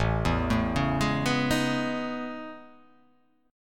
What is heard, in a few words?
A Major Sharp 11th